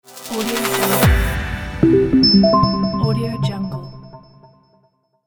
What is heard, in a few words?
new marketing ident